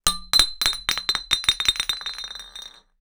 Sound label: Bottle falling floor